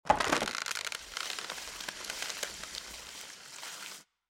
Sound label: tree Small